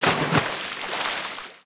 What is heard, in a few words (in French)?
plouf